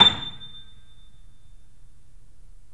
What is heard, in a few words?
A, Piano; InVision Interactive; The Piano